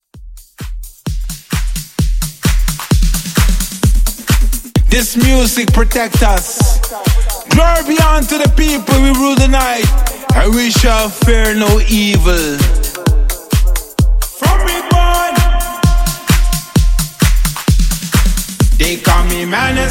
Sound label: Mashup; Remixfreakz